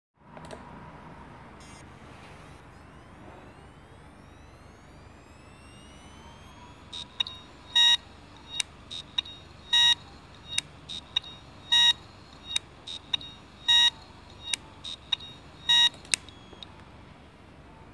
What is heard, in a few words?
Heads hitachi 3.5 1 TB, damaged hard drive sounds